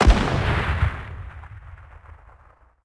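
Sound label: zenitka shot, anti-aircraft gun shot; World of Tanks Blitz; ambient neptune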